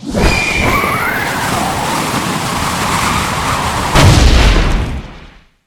ele lift

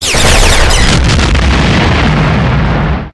sdl shot

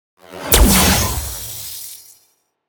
break; activities planetoid

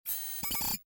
scan, ships

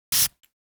perfume; house; perfume spray; CosmicRage

